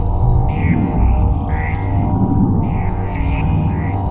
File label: Machine Samba; Sonic Foundry (Sony Creative Software); Ma Ja Le Ethereal Textures